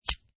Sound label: USB Retract; Sliding retractable USB thumbstick out; Computers (Sound Effects:Science Fiction)